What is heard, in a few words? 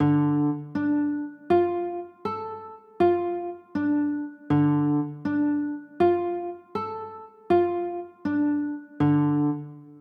d m, chords